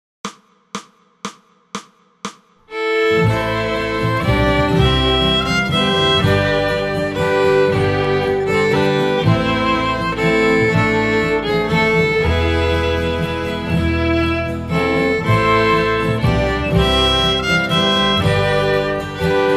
Bass All; christmas; What Child is This?

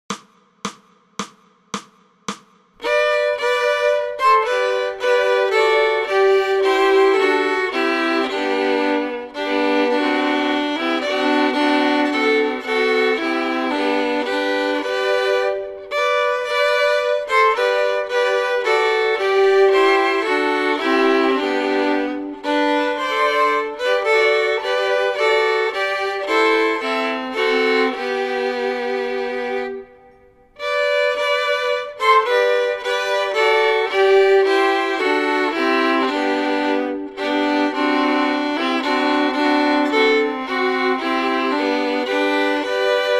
Fiddle; christmas; Away in a Manger

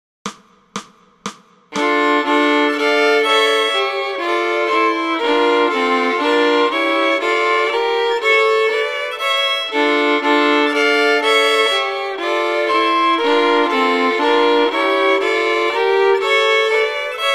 Fiddle; christmas; God Rest Ye Merry Gentlemen

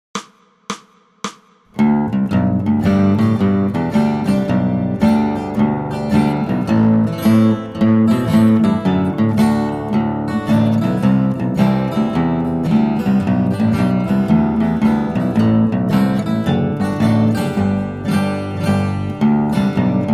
Bass 110; christmas; Christmas Hornpipe

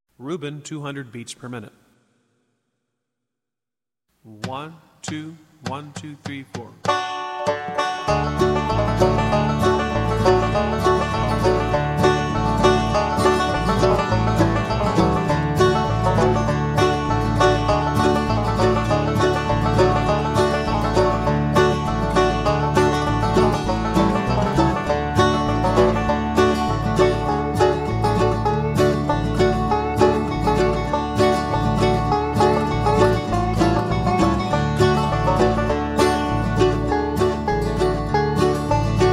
Reuben, mandolin

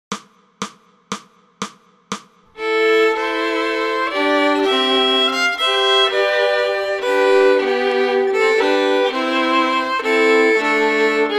What Child Is This Fiddle 1 2 3; christmas

